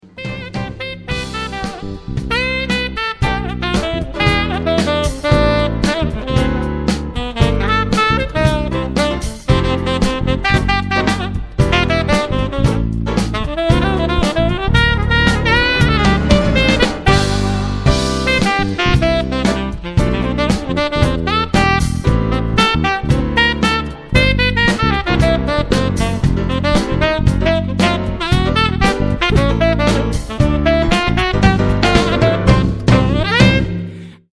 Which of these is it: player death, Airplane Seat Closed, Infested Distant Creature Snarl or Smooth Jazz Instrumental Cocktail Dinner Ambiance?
Smooth Jazz Instrumental Cocktail Dinner Ambiance